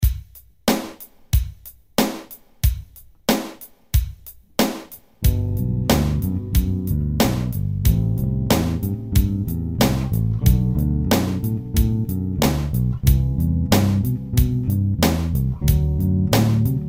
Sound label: Chords And Arpg, basses